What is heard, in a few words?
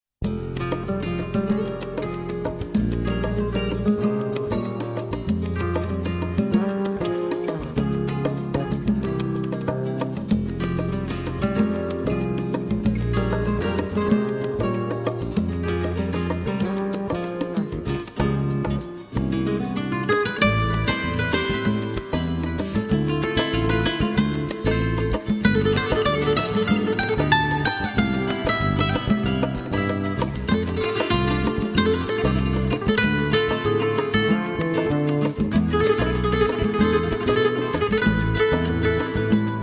Rumba